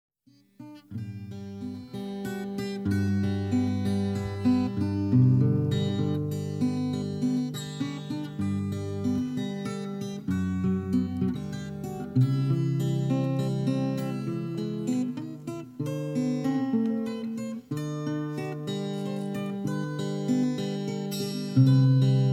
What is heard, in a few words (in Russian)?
соло гитары; Кавказ - молодёжный лагерь; музыкальное участие